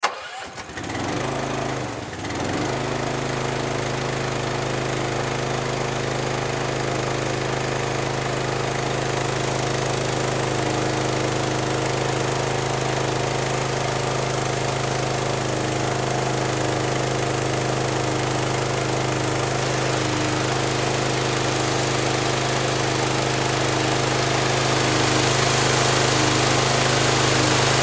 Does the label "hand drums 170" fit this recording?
No